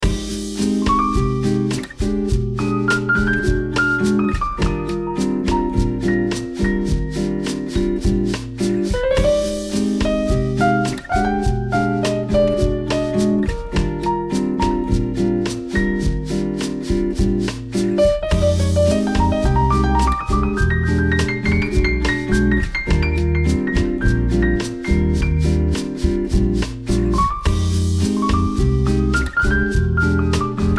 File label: jazz bossa nova